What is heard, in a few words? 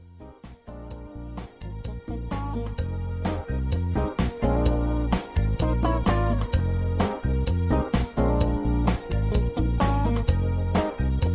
Extended Patter